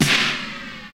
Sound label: Large Room Acoustic Snare D Key; snare drum samples